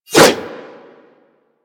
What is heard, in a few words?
Dagger Heavy Whoosh; Warframe; Weapons; Dual Daggers